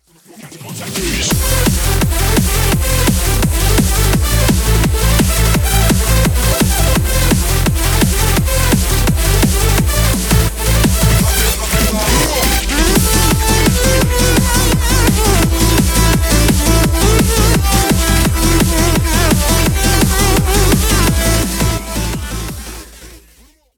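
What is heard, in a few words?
background music crossfade; Invaxion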